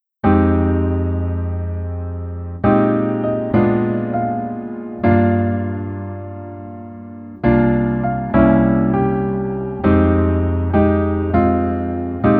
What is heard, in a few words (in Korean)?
Piano ver, 멜로디MR 남자키